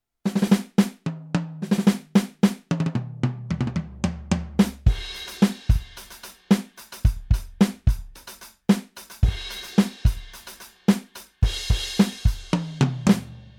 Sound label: MPS 750 Std Kit; Millenium